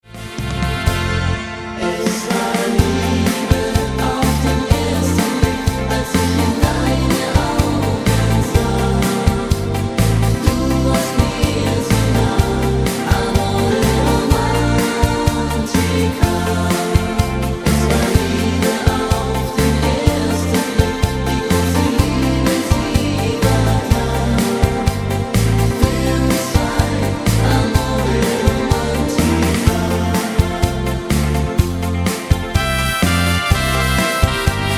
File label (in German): mit Chor